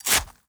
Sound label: Mannequin Knife